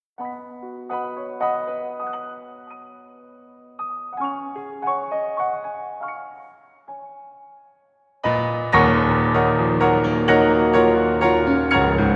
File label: Piano Duo